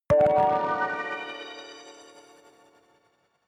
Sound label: notification kalimba magical body hit